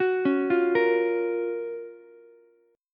F sharp Major 7th, Ukulele; arpeggiated; JGuitar

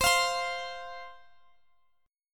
F 5th C, chord

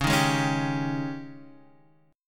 G sharp Major 7th Suspended 4th, C sharp

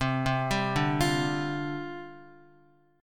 C Suspended 2nd Suspended 4th, down, Guitar; Csus2sus4 arpeggiated

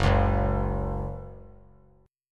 F sharp 5th F sharp; strummed; JGuitar

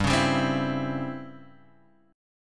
Db Minor Major 7th Flat 5th G

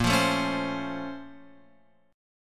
A sharp Minor Major 9th, down, Guitar, Open F; A#mM9 strummed; JGuitar

